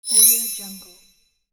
magic wand

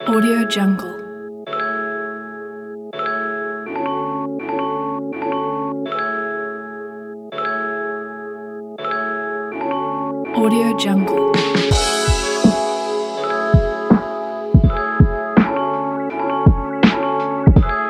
lofi hip hop beat

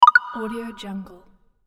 for notification

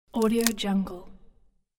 thin crack break

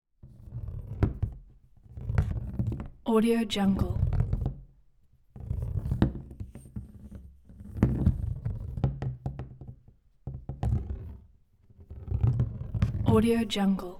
ceramic ball roll on wood chest

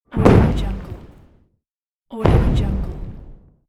smoke bomb

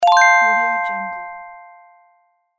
quiz correct answer

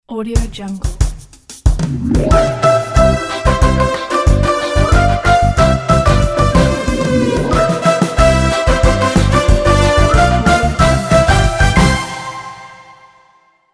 intro logo

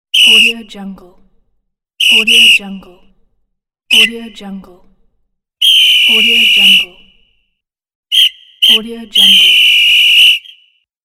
soccer referee loud whistle

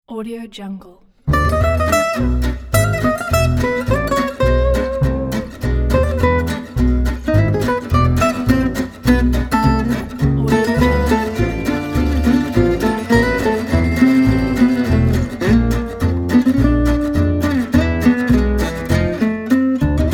swinging french gypsy jazz

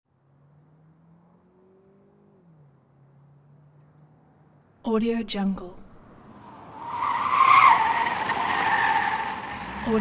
automobile chase skid and squeal accent

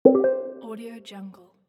pop ding notification; vinylmusic

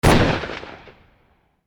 large gun shot

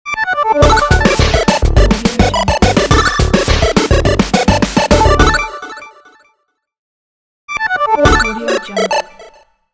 8 bit logo

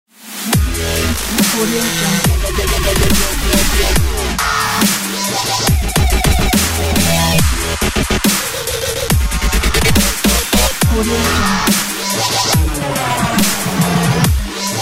dubstep logo pack

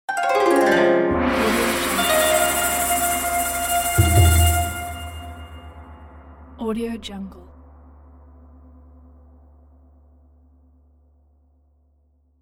asian stinger; applehillstudios

